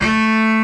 orchestra string hit D

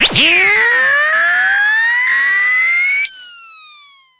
SH101 Tone

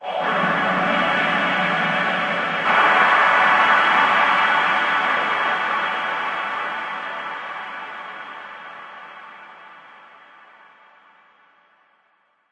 one million harmonics hit; LOOPING AMBIENCE

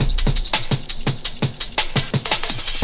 tambourine, drum loops and breaks, 170 bpm; 161 to 180 bpm

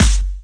Moombah Clap; Moombah Kit; Hip Hop; STYLE KITS (Hip Hop, Etc)

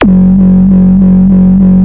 202 sus, synth; 202 synth construction kit

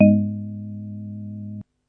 G sharp 2, Two Tone House Organ

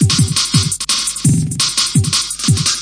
170 bpm roll me higher, drum loop

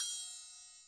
chappa A5, Chappa and Chanchiki Cymbals; ETHNIC and WORLD PERCUSSION

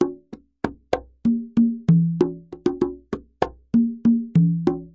plain conga turnaround, 97 bpm; 091 to 100 bpm

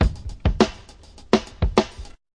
groove, drum loops and breaks, 100 bpm; 091 to 100 bpm

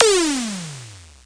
gliss down, Electro FX

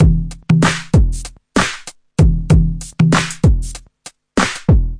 96 distorto roland, drum loops and breaks; 091 to 100 bpm

